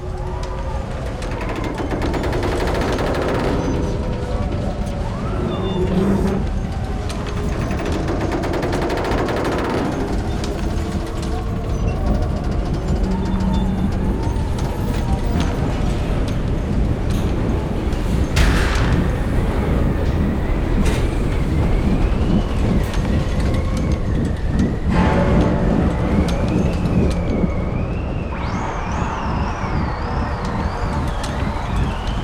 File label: Tower Ambience; Planetfire; bedlam